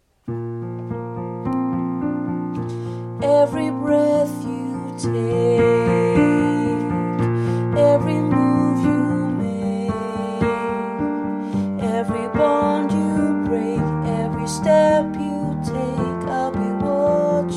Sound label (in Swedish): melodi